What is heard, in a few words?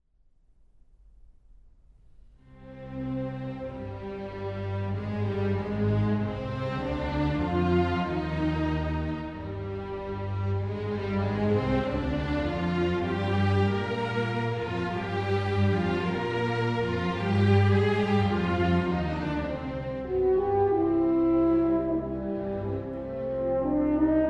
Concerto For Horn & Strings, Allegro moderato